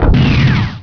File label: fire pulse